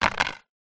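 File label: leisure frisbee plastic land wood surface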